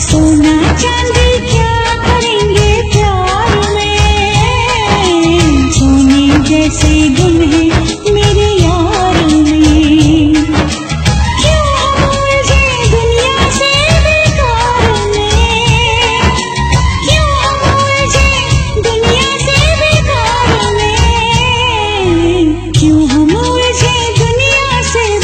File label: Old Hits Song Ringtone